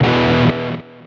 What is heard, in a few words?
Pickup Guitar Riff; SFX Kit; Pickups